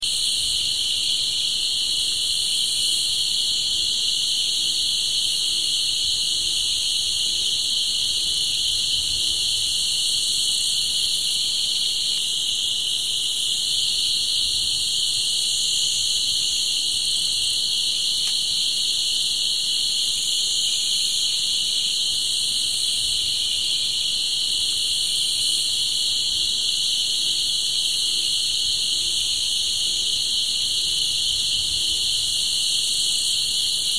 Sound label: African Cicada